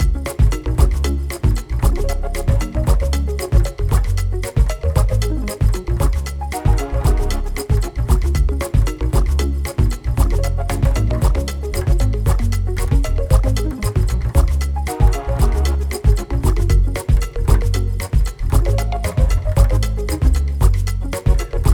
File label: music free spin; netent